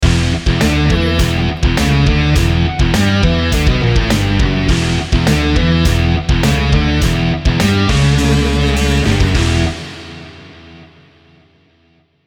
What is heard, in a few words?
cool rock logo